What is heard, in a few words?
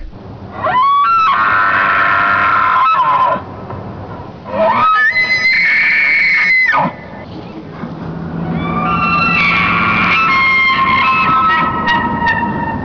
elk ridge sounds